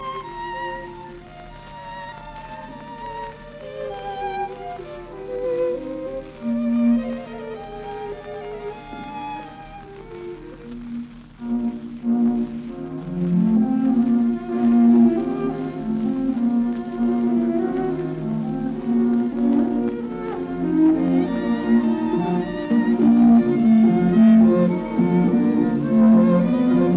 String Sextet in B flat major Rondo Poco allegretto e grazioso; Brahms; Op 18; Adolf Busch